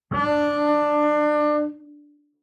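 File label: Bass arco fortissimo sul D D2, Double Bass; MIS Pitches - 2014; Strings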